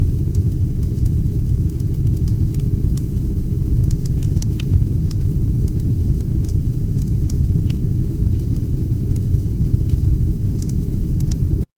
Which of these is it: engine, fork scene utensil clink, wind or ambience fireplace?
ambience fireplace